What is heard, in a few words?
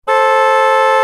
car horn